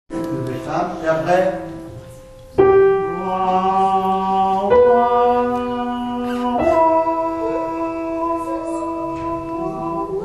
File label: BULLERENGUE